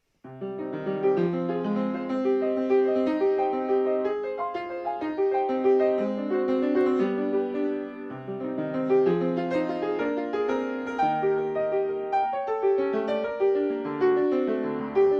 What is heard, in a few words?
miniature animato; kirchner theodor